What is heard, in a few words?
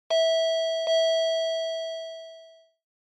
Intercom Ding Dong Close